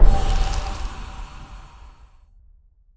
scatter hit new; netent

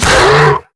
Grunt Hit Light; netent

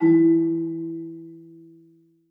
melody E3; netent

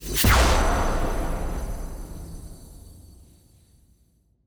small win; netent

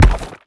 symbol Landed

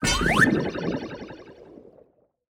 symbol remove throw; netent

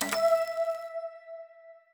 sparks spin Button; netent

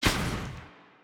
counter burst

